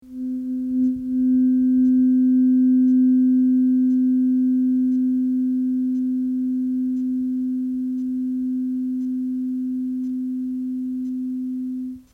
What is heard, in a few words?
tuning fork